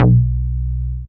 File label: Short Puls Bass